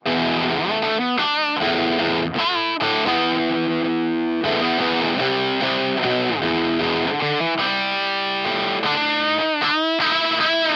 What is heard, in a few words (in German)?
fuzz test treble 15 uhr drive 12 dry 15 uhr